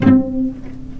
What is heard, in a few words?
C3 PIZ CEL, string; East West; The Ultimate String Collection